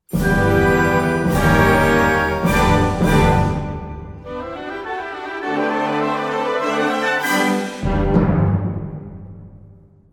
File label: piano